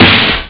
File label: blam, drums snare; OctaMED SoundStudio V1